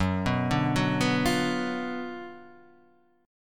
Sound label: F sharp Major 7th Suspended 4th Sharp 5th, F sharp, down